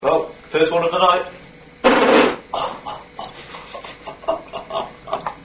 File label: first, farts